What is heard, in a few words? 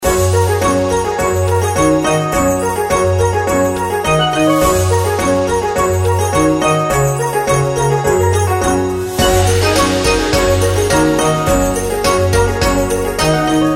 bgm; cny 2021